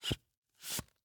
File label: Handbellows, leather, wood, brass, open, close, wheezy, blown air, supple, creaky; Eiravaein Works; Vaeyan IV